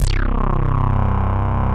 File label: 303 stab; Pro-Rec - Synthsonic